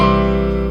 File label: beep; AnsMachine; Greetings